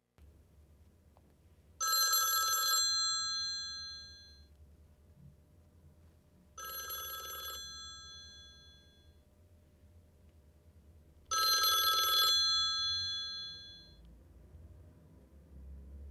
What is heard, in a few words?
Old Phone, olofonia